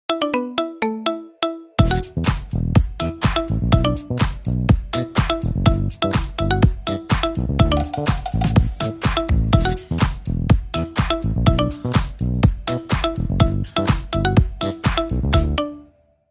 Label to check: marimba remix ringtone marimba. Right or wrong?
Right